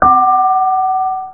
cheer; Games Galore 3; Asteroids

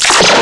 SHOT; 17 Bit Disk 51

